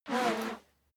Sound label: whoosh whistle slow steady; swooshes-swishes